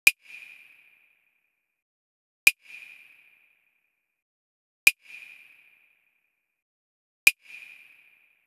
Drum Loop 100 BPM Percussion; Cymatics; Vibes II Drum Loop Collection; Brilliant Drum Loop

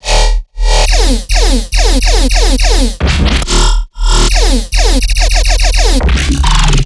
Riddim Bass Loop E 140; Tesla; Ghosthack; Riddim Essentials Volume 2

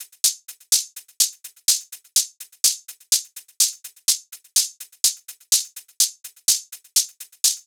Fever Dream Drum Loop - 125 BPM Hihats; Loop Stems; Cymatics

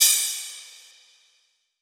Cymbal, Hip Hop; Drum One Shots; Hold

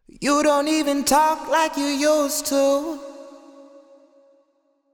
Sung Phrase Wet - 70 BPM G# Min, Vocal; Cymatics; Euphoria Vocal Sample Pack

